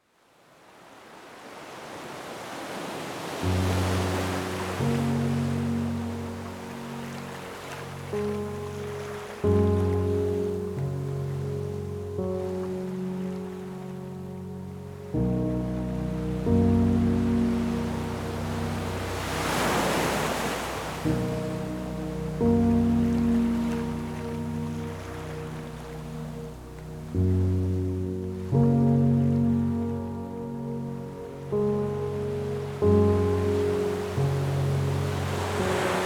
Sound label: piano, sleep mood with ocean waves